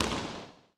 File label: Ball Dribble Regular Court